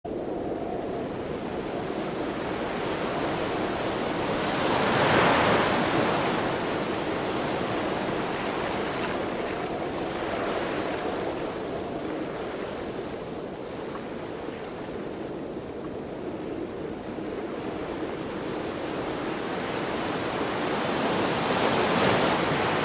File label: Sounds of the Cliffs Waves